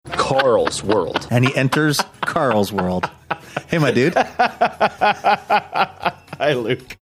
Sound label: laughing saying hi